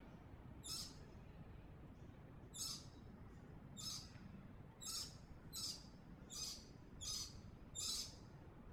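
Analysis lorikeet chirps resynth, Rainbow Lorikeet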